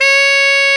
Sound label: SAX TENOR; 3way; Roland LCDP07 Super Sax